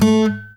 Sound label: ACSPOP, Guitar & Bass; Quantum Leap